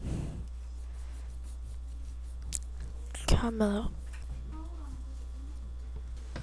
camera

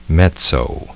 mezzo

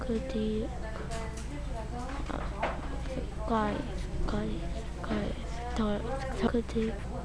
cry

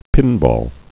pinball

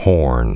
horn

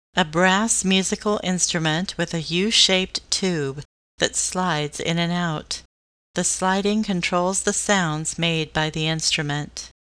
trombone

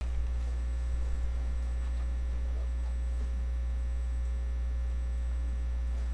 owl

